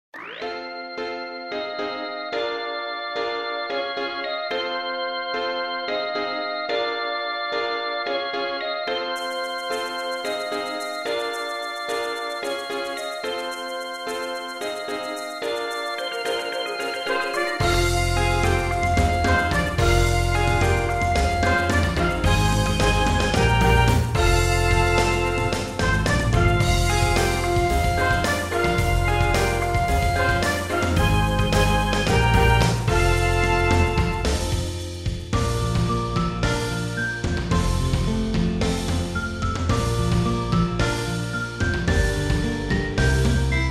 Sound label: karaoke